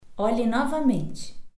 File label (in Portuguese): erro